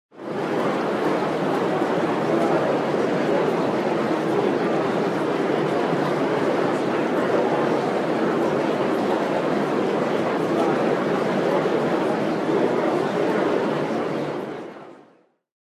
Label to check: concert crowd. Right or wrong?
Right